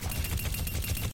Pistol Ping

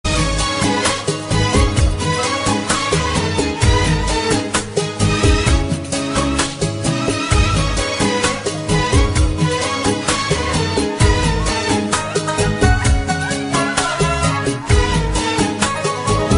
Is Calling Inspirational

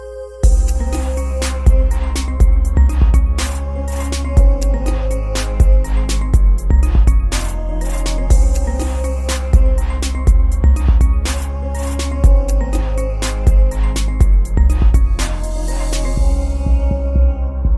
Deep Hip Hop Beat

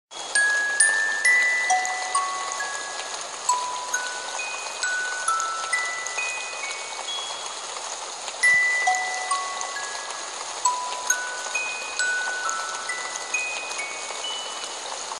Rain

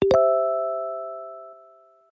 Nexus 5 notification

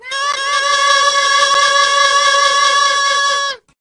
noot intensifies

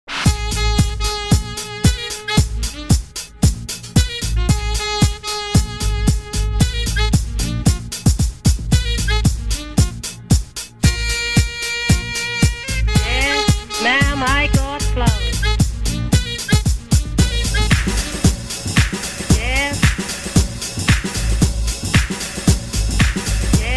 Jazz

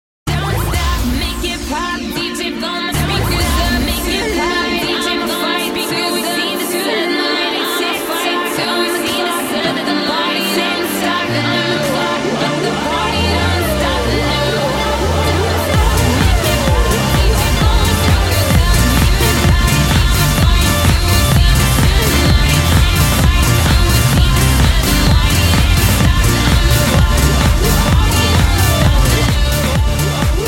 walkman dj, hip hop ringtone; sony walkman

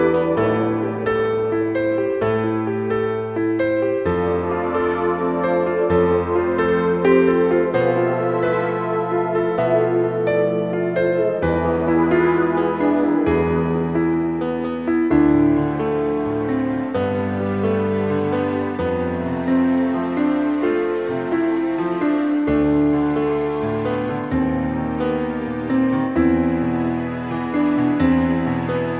piano, ringtone

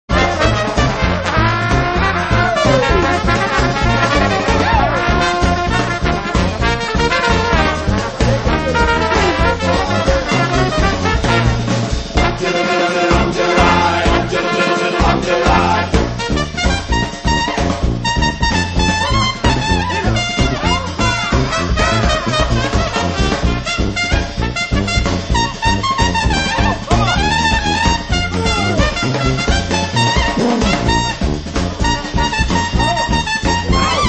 instrumental

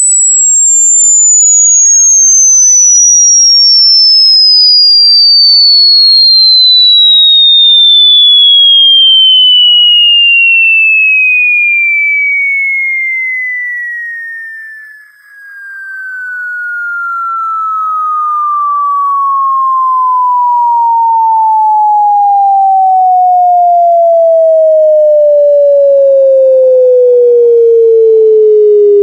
tiiiiiiiiit; ringtones

